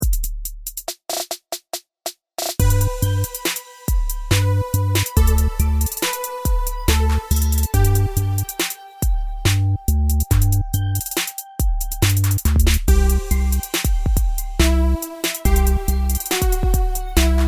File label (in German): G Dur HipHop Tempo 70; Leise rieselt der Schnee